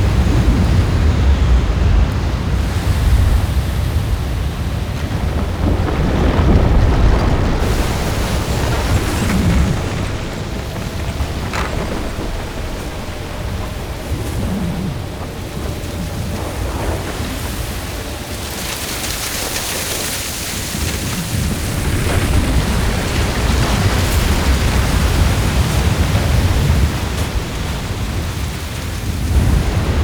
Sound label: Exterior Foreground Desert Angry Jealous Loop, ambience; Duviri